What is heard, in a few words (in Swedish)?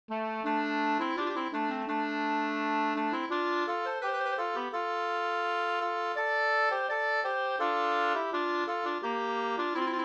skanklat